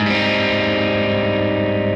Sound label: Abmaj7 Arpeggio down